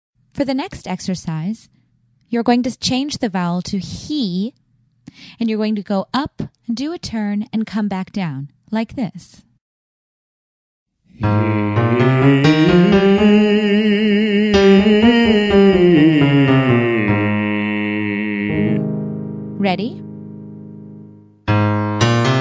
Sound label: Octave Turn male